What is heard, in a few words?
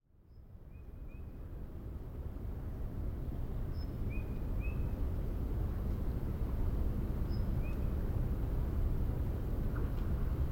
ambience